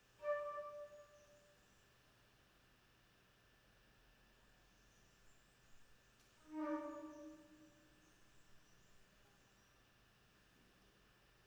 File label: flute; Suite for flute Mvt 1 Sepulchral Sighing